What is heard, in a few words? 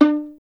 STR VLN, Solo Instruments; Miroslav Vitous